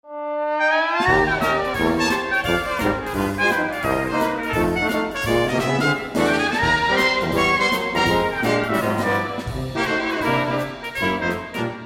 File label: Brass Quintet, Dixie